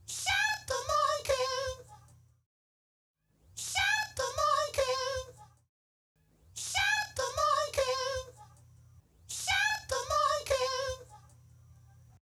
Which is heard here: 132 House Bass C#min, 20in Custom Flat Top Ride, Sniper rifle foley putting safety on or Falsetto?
Falsetto